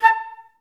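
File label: flute staccato A3; Best Service; Peter Siedlaczek's Advanced Orchestra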